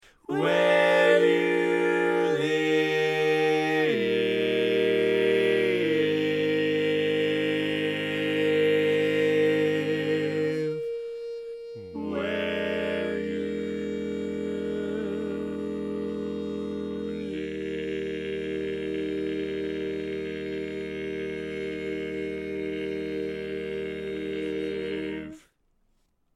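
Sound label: Baritone, barbershop tags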